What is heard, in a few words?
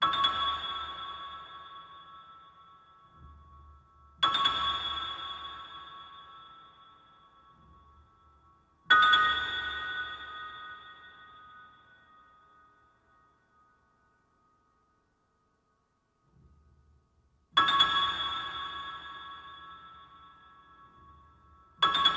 Sad Bells